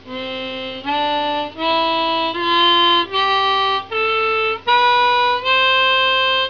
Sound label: scale up slo